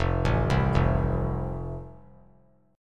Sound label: F Major, F Chord; arpeggiated